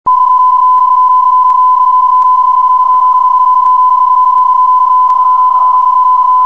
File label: psk31